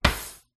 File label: household kitchen utensil drawer close hard